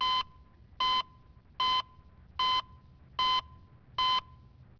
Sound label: beep